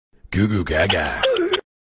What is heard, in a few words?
goo goo gaga